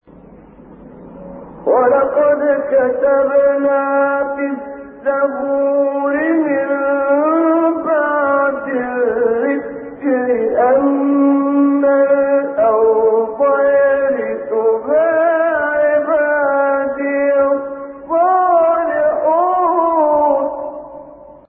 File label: kurd, maqamat